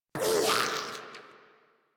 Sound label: Attack Effort; Grineer Ghoul Expired